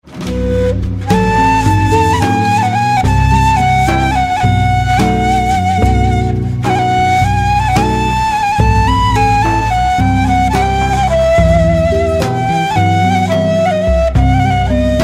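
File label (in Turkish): incir ağacı zil sesi; Kürtçe Zil Sesi; Dar Hejiroke yani İncir Ağacı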